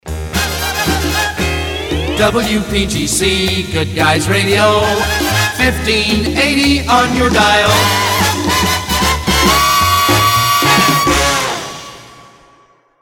jingles, 1580 on your dial; pams; series 27 jet set

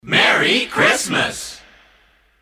christmas kit group shout merry christmas

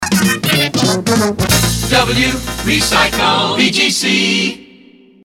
jingles resings recycle; pams

